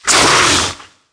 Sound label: nehebkau attack; DAoC; Toa; Gamestar